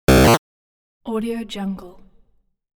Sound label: machine 8 bit rejection